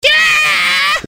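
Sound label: geeeaaahhh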